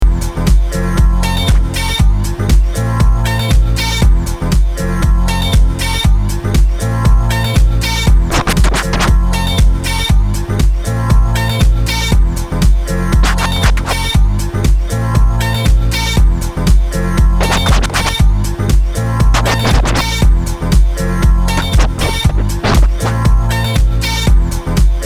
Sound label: Scratch